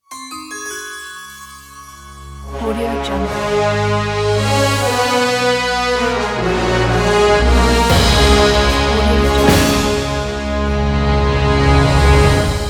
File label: orchestral logo; soundbay